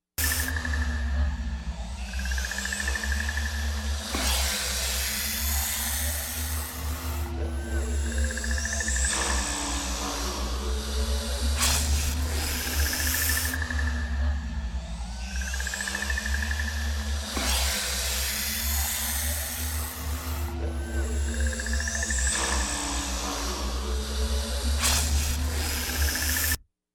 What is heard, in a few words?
Snakes; Thornberries